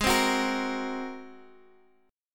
Ab 9th, Guitar, Half Step Down; chordshape

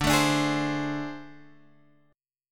A Minor Major 7th Double Flat 5th

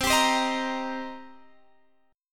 C Minor 7th C Guitar Standard; Cm7 strummed; JGuitar

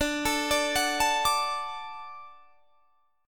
D Major D down, D Chord; arpeggiated

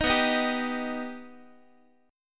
B Augmented B Guitar, chord; strummed; 18,19,18,17,x,x

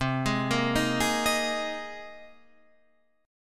Bb 7th Suspended 2nd Suspended 4th C

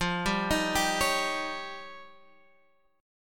F 7th Suspended 2nd Sharp 5th, Guitar, Open G

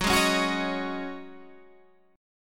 Ab 7th Suspended 4th Gb

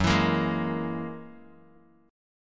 F sharp 9th F sharp Bass Standard; F#9 strummed

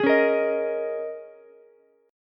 A sharp Minor 11th A sharp down Ukulele C6, chord; strummed; JGuitar

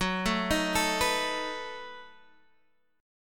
B 7th F sharp

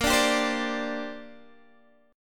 A sharp 6th Add 9th, Guitar DADGAD

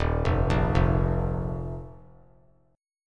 D sharp Suspended 2nd; arpeggiated; JGuitar

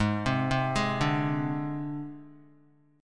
G sharp Major Flat 5th, Guitar

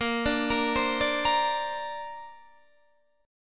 Bb Major Add 9th, Bb, down, Guitar, DADGAD

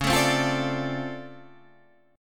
D-Minor 7th C sharp, chord

